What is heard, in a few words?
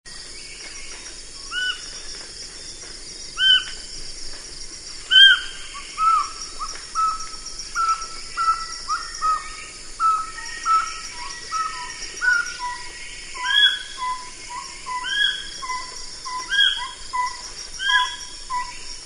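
splyushka voice, scops owl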